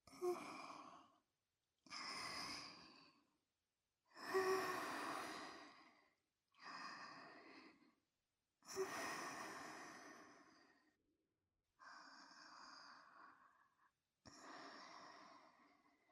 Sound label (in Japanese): 寝息ループ, ASMR